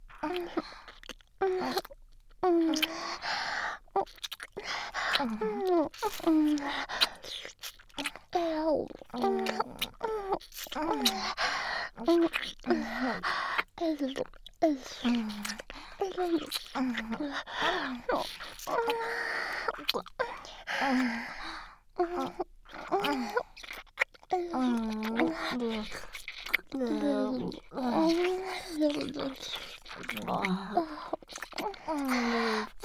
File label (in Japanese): キスループ